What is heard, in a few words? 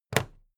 plastic clasp close heavy